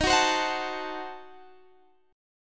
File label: Eb Diminished 7th Eb, Guitar Standard; Ebdim7 strummed; x,18,16,17,19,17; JGuitar